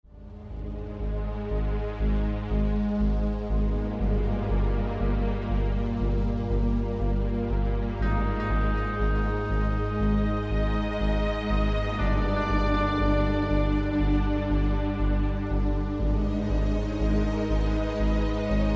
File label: Sound Track